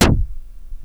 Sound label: snare, Chroma Polaris